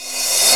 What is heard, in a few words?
Crash up3 Bk; Digital Sound Factory